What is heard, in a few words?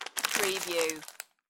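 Plastic Wrapper Smush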